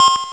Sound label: Time Out; Classic800XL